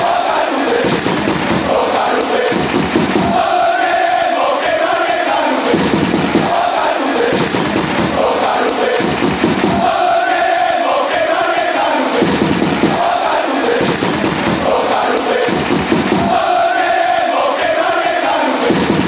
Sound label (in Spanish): todos queremos que marque kanoute, fan chants